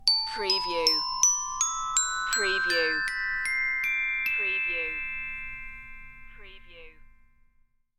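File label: Xylophone Ascend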